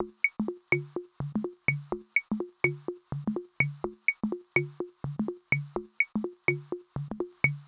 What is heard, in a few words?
Fx Bbx Loop 125; Equipped Music